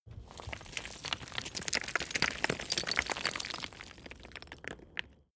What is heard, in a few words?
stone medium landslide short